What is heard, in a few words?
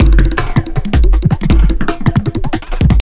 JUNGL 160, JUNGLE LOOP